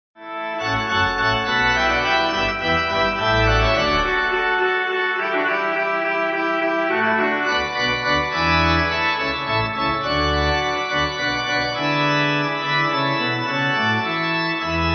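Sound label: Hornpipe Pipe, Pipe Organ; Handel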